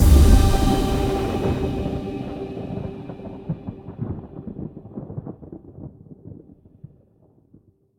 Combo G

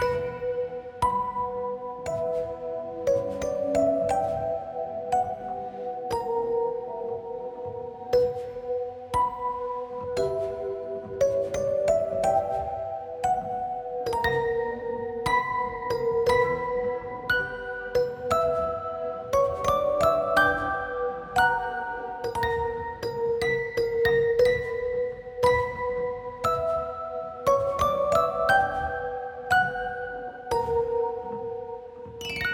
Music Box; Dawn Carnival; curse crackers for whom the belle toils switch windows gamerip 2022

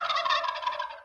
Sfx - Turkey Mom Panic

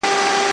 Saw; iStunt 2 Android iOS mobile gamerip 2011

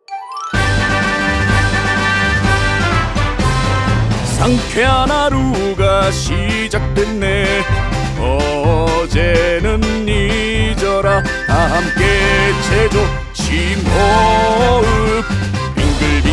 Korean Sound BGM

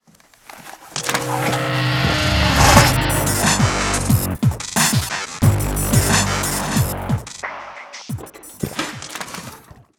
Sounds Cinematics, Napalm Introduction

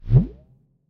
Mountain Mech Rock Form; Mountain SFX

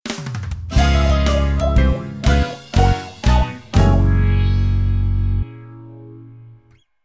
Lose; blokus portable steambot championship; psp

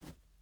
wood jump; teardown; ps5 windows xbox series xs

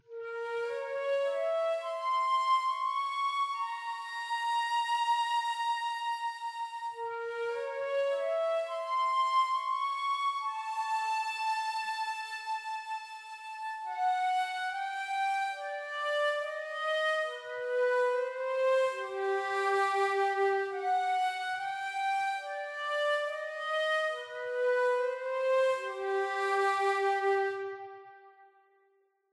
Exploration Flute

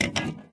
Item Place

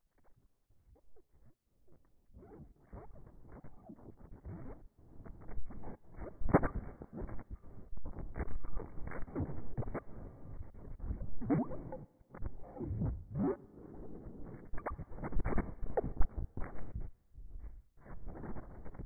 random ambience; Five Nights at Treasure Island 2020 Remake

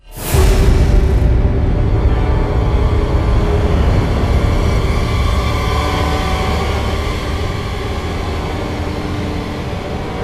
Suspence Arc Snare; Apex Legends Season 12

